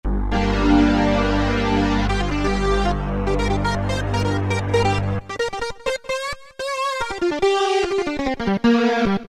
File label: Wavy Motion, Roland PN-D50-03